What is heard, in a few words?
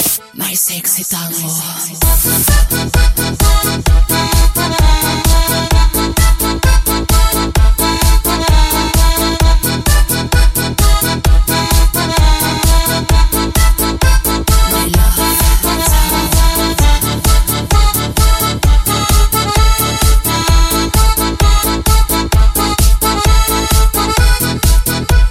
sexi tango